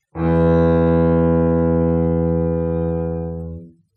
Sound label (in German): leeres e gestrichen